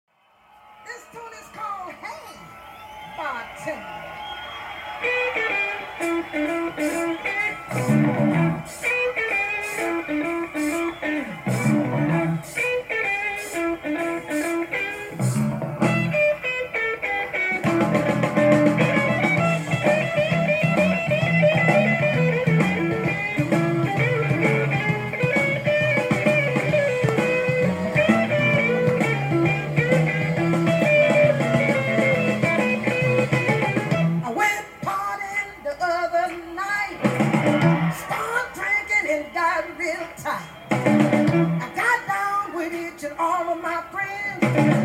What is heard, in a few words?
Blues Deluxe; オムニバスアルバム